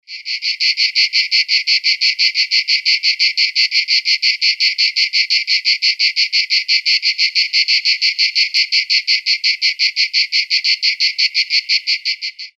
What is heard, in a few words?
Cicada orni